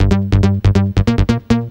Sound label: bazzz; Techno Music Maker; Bass